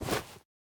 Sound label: remove one, bundle; minecraft